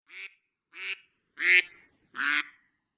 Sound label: doppler shifted ringtones iphone